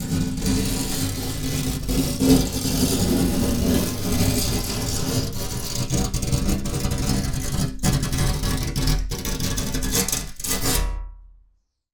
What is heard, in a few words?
Wire Brush Glass